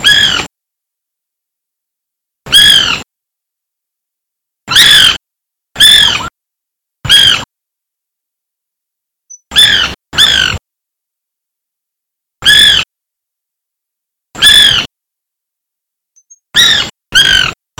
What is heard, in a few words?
Melanerpes candidus - Carpintero blanco